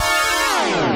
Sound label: fear sting; Undertale